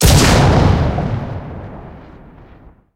cannon 76mm qf17 shot, tanks; cannons; War Thunder

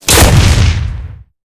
tank hit aphe small; tanks; War Thunder

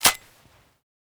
double shotgun bolt forward; Rust

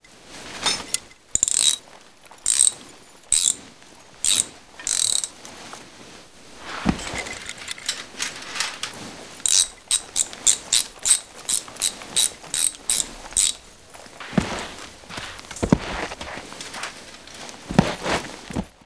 mechanic foley; trainer; Call of Duty Modern Warfare

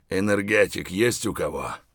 tired, voice; Escape from Tarkov

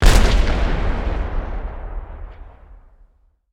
ground explosion layer

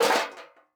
drop metal sheet; Rust

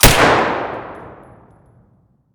dvl fire indoor distant; Escape from Tarkov

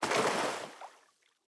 footstep water land; Rust

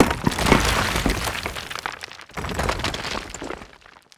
stone gib; Rust

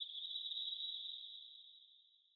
cave insect trill; Rust

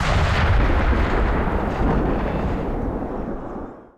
20 23mm tail far, guns tanks; War Thunder

